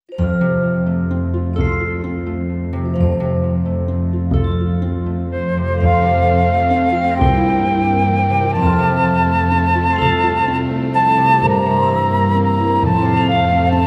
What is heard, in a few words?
Cool down Curtsey